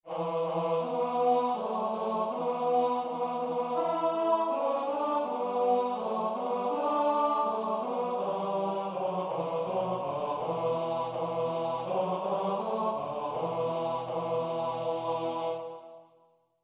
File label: tenor